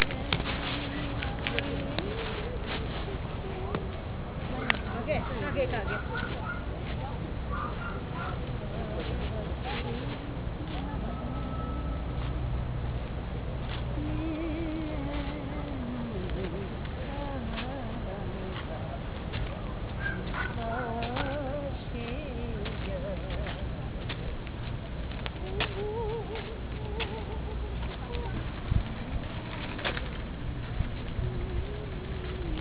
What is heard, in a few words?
old singing woman, Korea; old singing woman (sound)